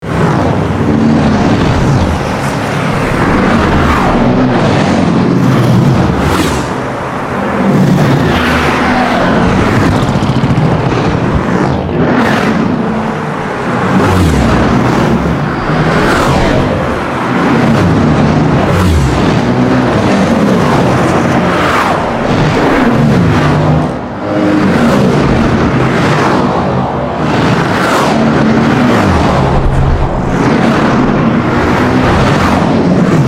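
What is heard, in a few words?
Ambience Highway Traffic Loop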